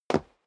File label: step sneaker wood parquet single stair up; schritte